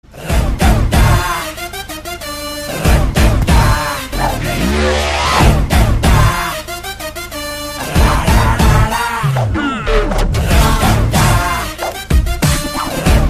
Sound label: Background Music